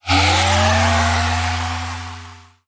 Sci-fi Transition

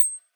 Glittering Clink

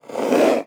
Hard Slide Short

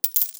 Coin Drop Clink

